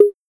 Perc Conga High; Electric Empire

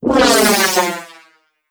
Rocket; Hi Tech

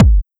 Kick Dubstep

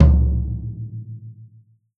Tom Low

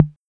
Tom High TR606

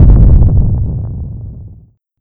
Kick House

